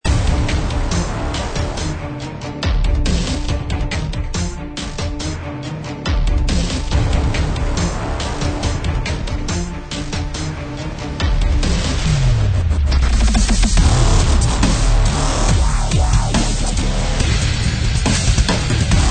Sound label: Epic Hybrid Dubstep Full Loop